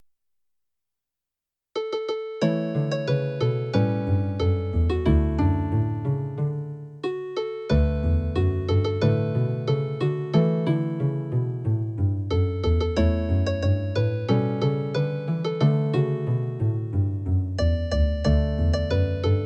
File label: dulcimer and bass